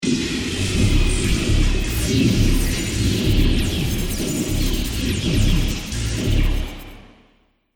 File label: Space Battle Outside Spaceship, spacecraft sound effects